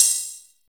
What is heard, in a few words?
tambour, tambo shaker